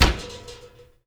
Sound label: Grill Door, household rhythm samples Hits